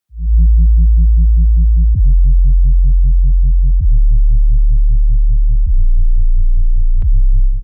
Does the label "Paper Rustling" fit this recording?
No